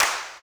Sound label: CLAPS HIP, CLP